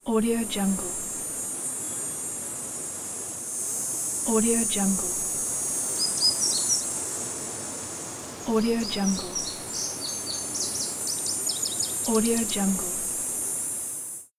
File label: forest